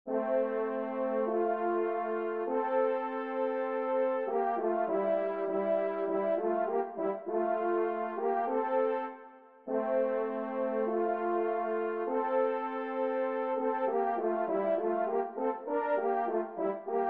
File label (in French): DUO cors, Mi b Maj; Kling; duo cors n 24